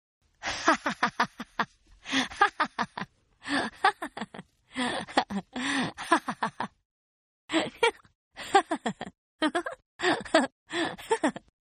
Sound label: Giggle Female, Laughter; Voice